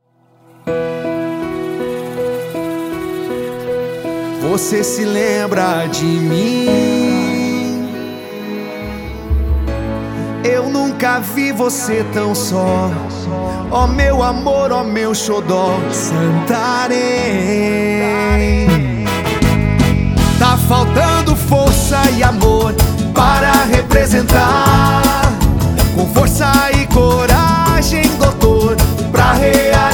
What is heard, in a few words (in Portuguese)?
Jingle PREFEITO; Coragem pra realizar; DR ACR; RENOVACAO VOLTANDO